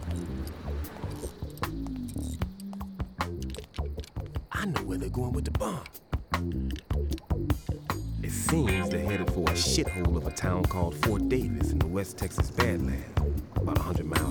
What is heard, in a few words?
Helicopter Fuzz